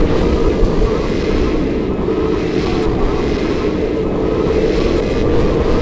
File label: Ambient